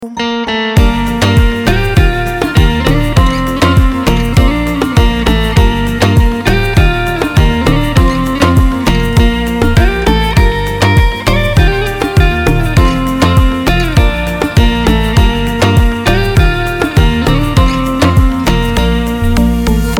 beautiful sad melody

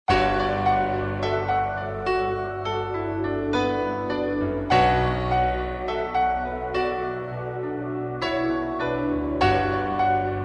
nu metal

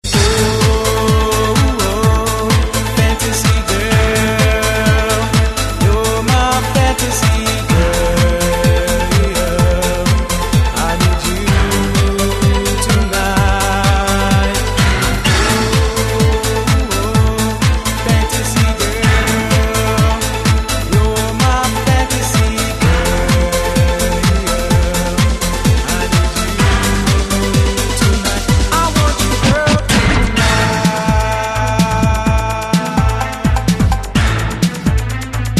modern bass

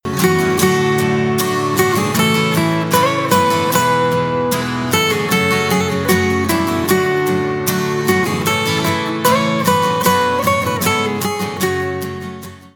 guitar

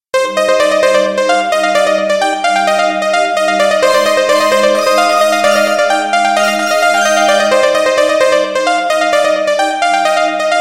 motorola moonlite haze, ringtone

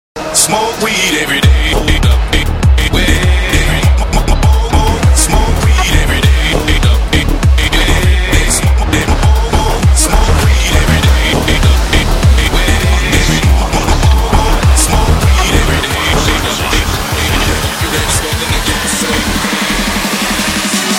drum and bass

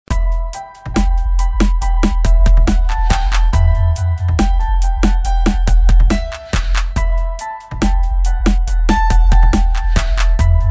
lyric piano

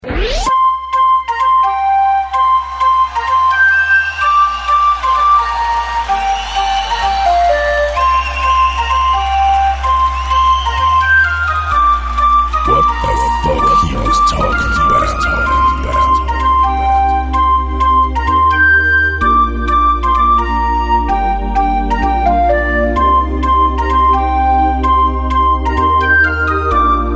flute

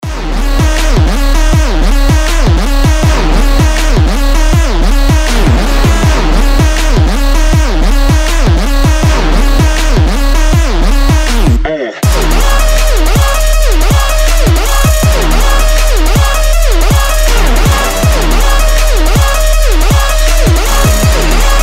dubstep

